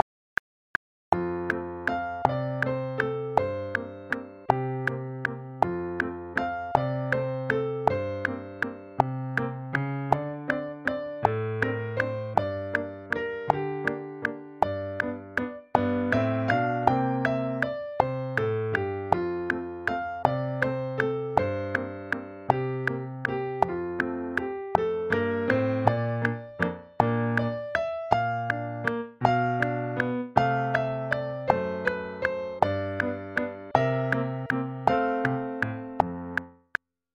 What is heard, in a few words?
with metronome